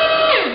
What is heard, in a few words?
Unused Door Sound